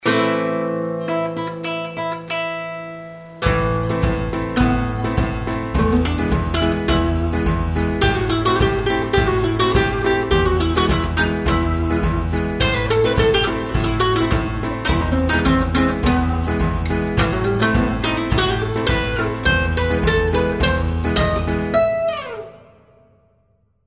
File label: Spanish Dance; Popsicle Pete